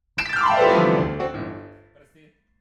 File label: PIANO